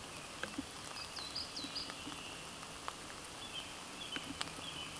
Parus ater

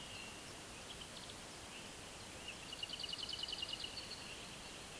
Sylvia curruca

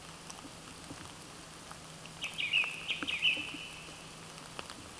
Turdus philomelos

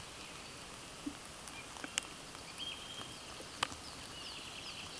Haematopus ostralegus

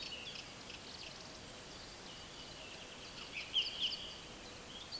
Anthus trivialis

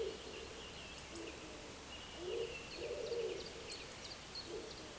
Columba palumbus

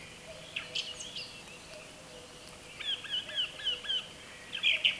Jynx torquilla

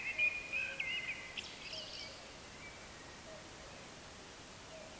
Turdus merula

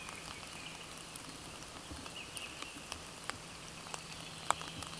Tringa nebularia

